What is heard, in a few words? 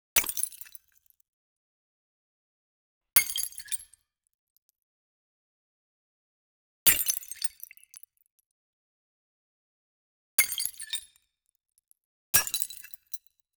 create the sound of break, breaking